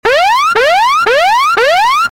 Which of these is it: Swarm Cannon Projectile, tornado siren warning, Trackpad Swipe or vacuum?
tornado siren warning